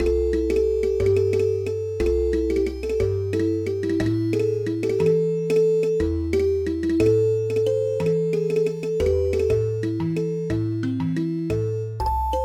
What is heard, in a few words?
africa jungle; Che Che Koolay